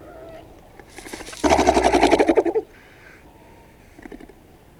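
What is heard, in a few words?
Antarctic fur seal male in air territorial call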